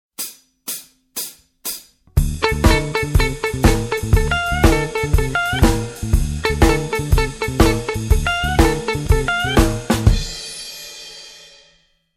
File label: blues jazz